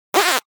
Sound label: Fart Foley Fart Combination Thin; Hollywood Edge; sound designer's toolkit v2